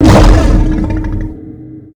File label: Dragon Grunt